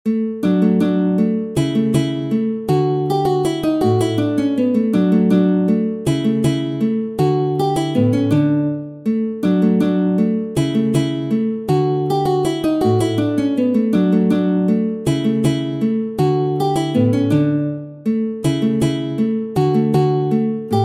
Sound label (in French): Walze, Méthode pour la guitare; Ferdinando Carulli; Op. 241; Classical guitar